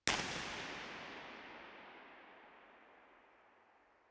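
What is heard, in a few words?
warehouse, impulse response, factory warehouse; terrys; b-format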